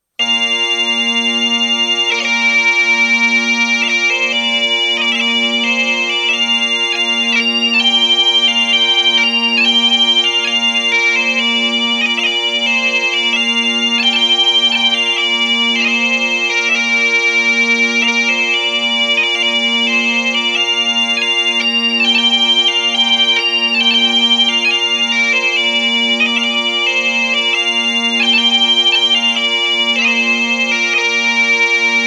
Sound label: technopipe